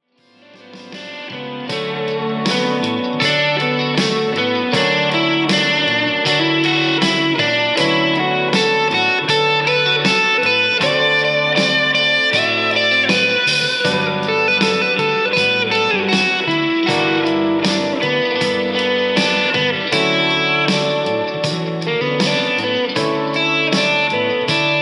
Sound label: B minor